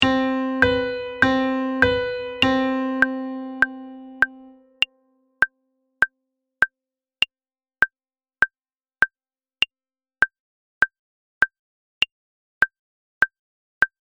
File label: Major 7th